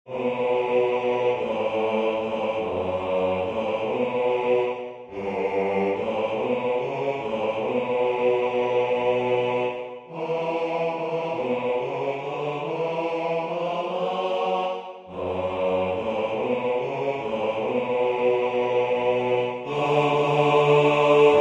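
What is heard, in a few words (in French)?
basse, Musique sacrée; O nuit brillante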